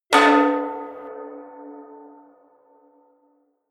large can reverb, hits impacts; whooshes rises

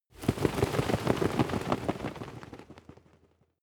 bird wingflap, voegel; natur tiere

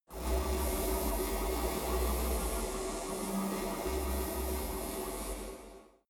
space buzzing energy plant; energie

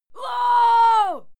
female fight scream

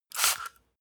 spray bottle single; haus garten

